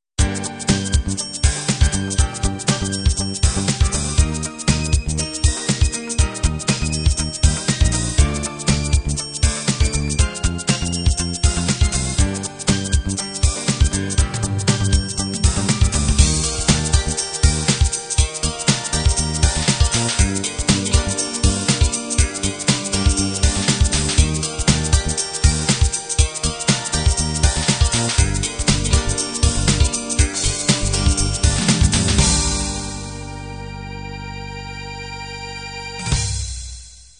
trendy beat

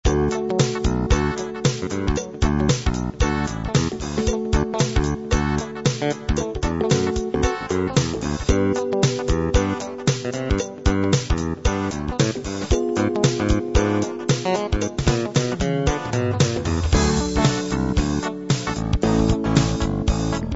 funk hiphop

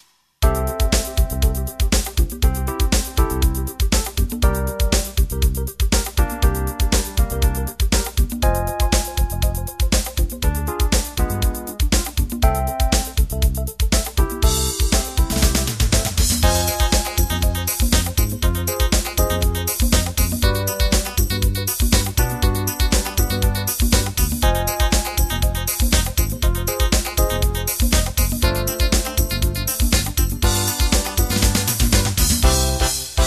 DIsco Jazz

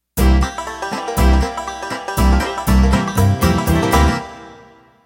BlueGrass Intro

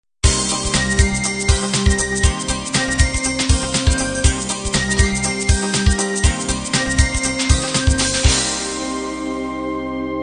trendy beat end

